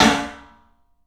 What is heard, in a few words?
CLUB SNARE; 3D Sound; 3D Drums